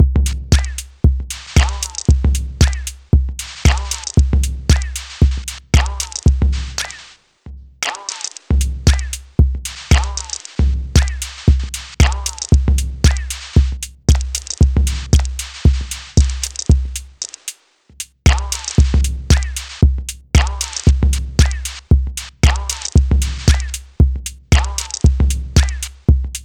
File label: Digitakt Sample; Digitakt Sample Pack 2 Demo